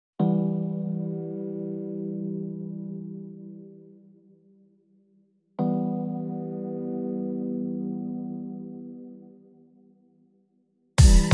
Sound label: Drums Keys and Bass Only